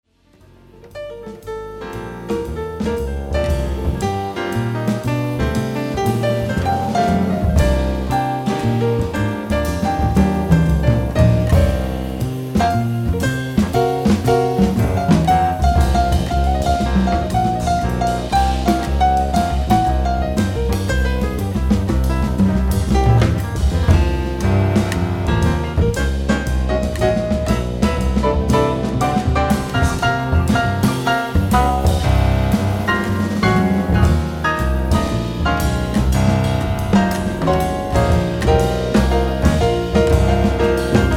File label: trio expatriate blues